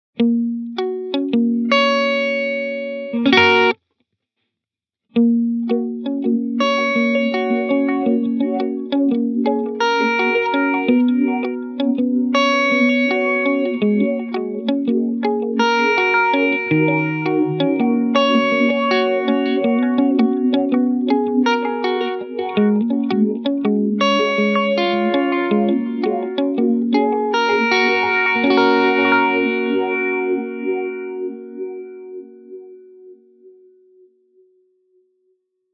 Filter Pulse

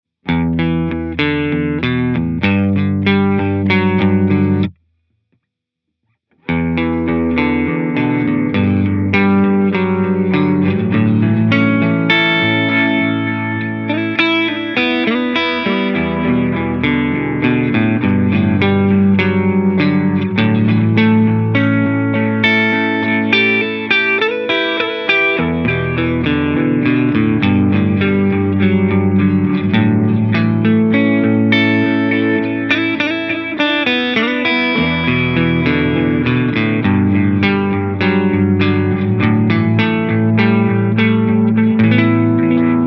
Analog Tube BBD, echosystem

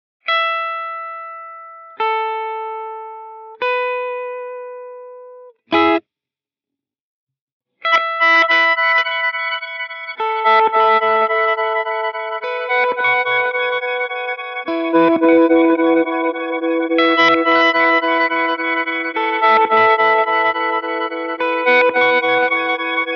Reverse Reverse Dual Pitch

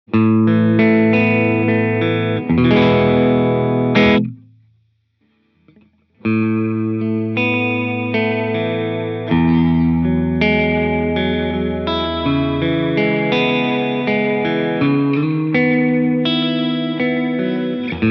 Tape Space Echo

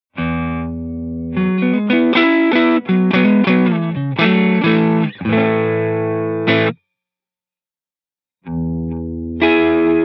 Tape Old Tape, echosystem

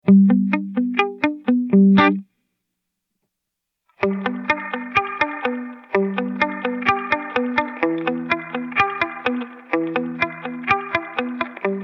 LoFi 50s Radio, reverb

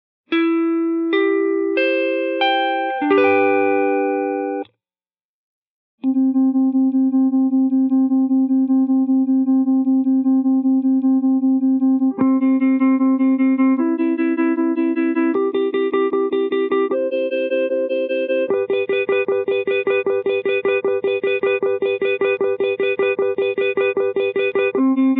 Auto Stutter

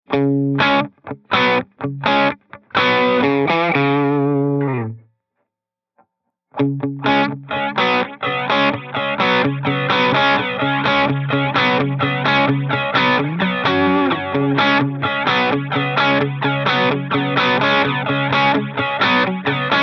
Analog Deluxe Memory Boy

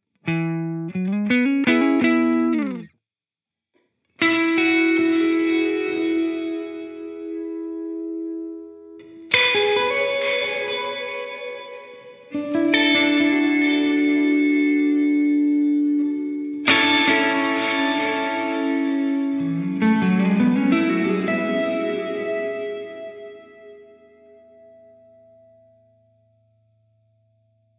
Delay Reverb Plate

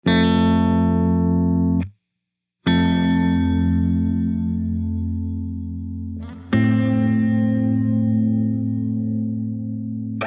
Hall Modern Super Long

